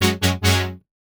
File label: Synth Shot F# Future; Trap and Dubstep; Synth One Shots; Ghosthack; Free Trap and Dubstep Samples 2018